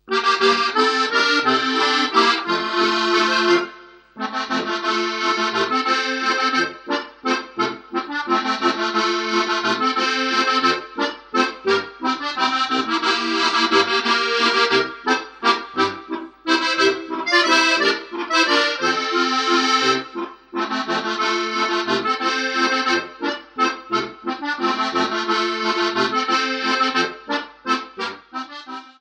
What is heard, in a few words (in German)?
Walzer (1. u. 2. Stimme)